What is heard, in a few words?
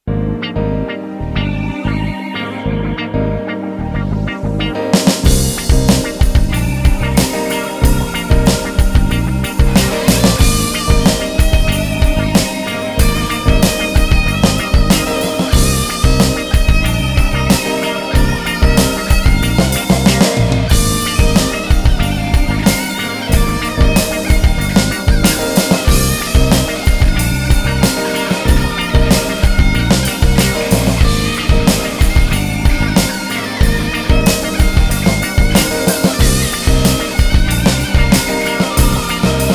Smooth'n Groove